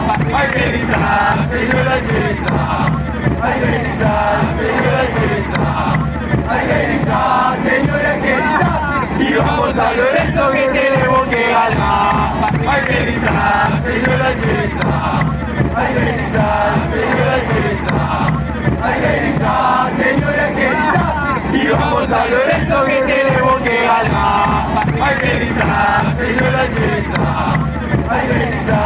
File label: we have to scream, chants